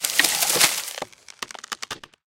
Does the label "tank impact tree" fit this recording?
Yes